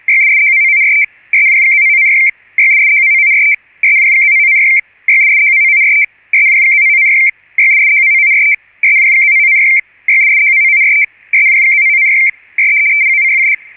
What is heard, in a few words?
PACTOR SELCALL; used by Pactor-I, II and III